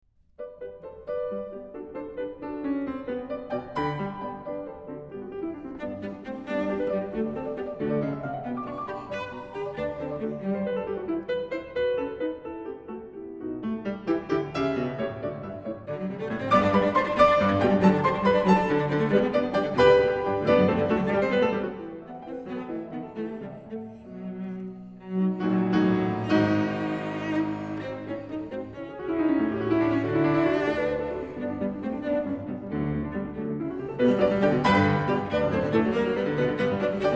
12 Variations for Cello and Piano

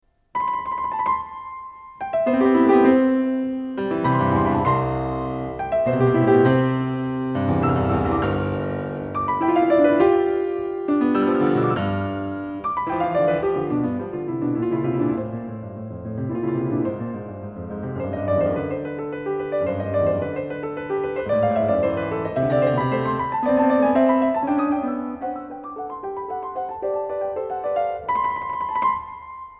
33 Piano Variations in C; Anton Diabelli

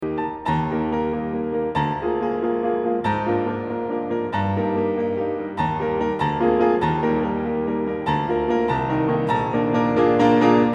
15 Piano Variations and Fugue in E flat

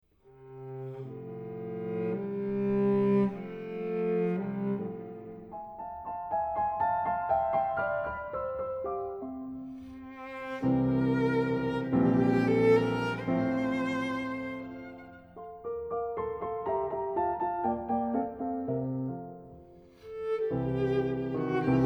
12 Variations for Cello and Piano

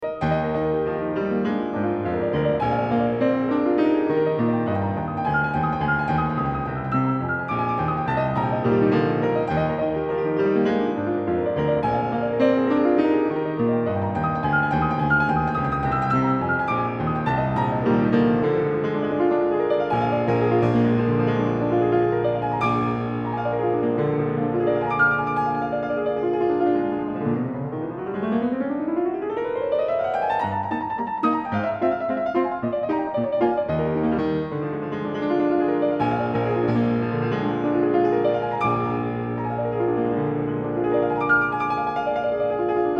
15 Piano Variations and Fugue in E flat

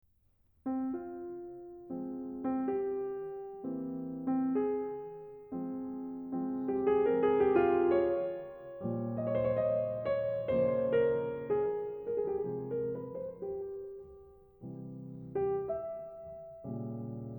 12 Variations for Cello and Piano

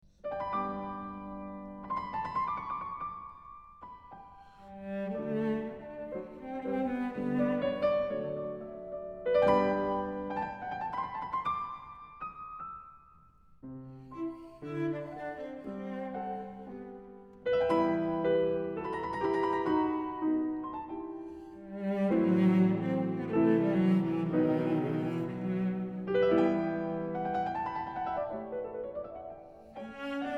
12 Variations for Cello and Piano

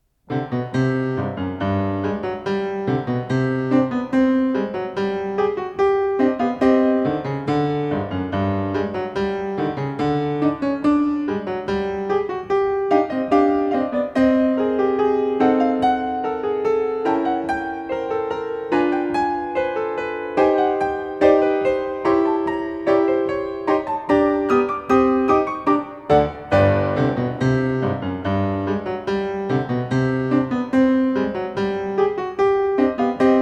33 Piano Variations in C; Anton Diabelli

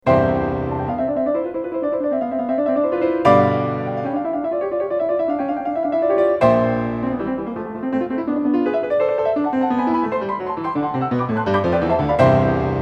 33 Piano Variations in C; Anton Diabelli

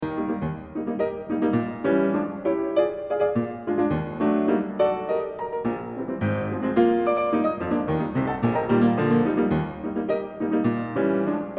15 Piano Variations and Fugue in E flat

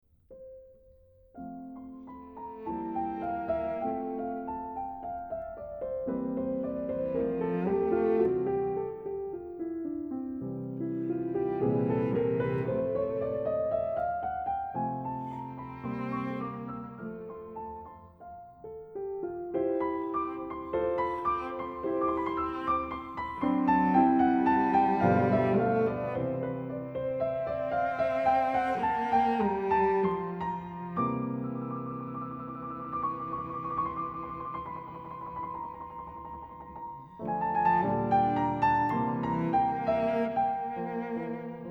12 Variations for Cello and Piano

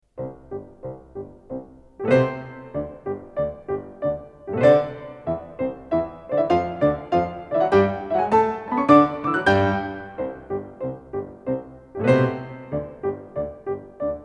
33 Piano Variations in C; Anton Diabelli

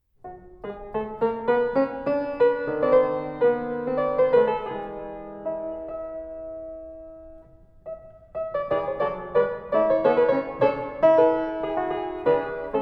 Piano Piece in A Major

